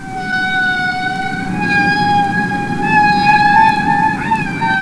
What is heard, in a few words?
whale; quake2; weapons